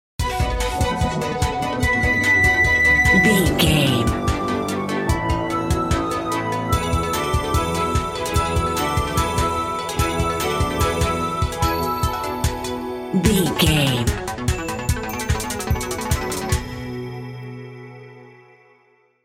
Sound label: video game title screen bumper